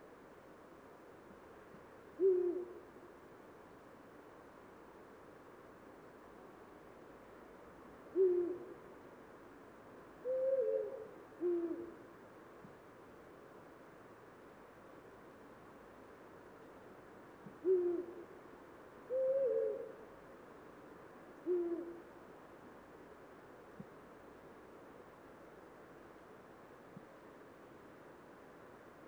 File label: Eurasian Eagle Owl